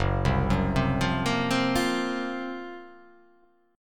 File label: F sharp Major 7th Flat 5th